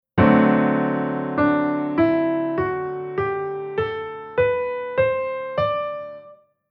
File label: Chord Chord Scale Sec Dominant